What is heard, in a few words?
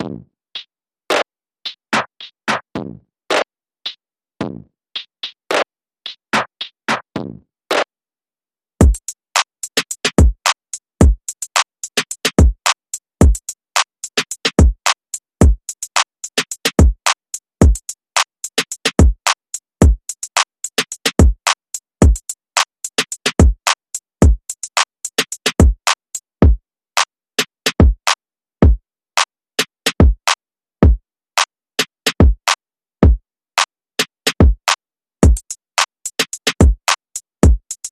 Drums